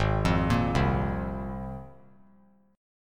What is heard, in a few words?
G sharp 6th Add 9th G sharp; arpeggiated; JGuitar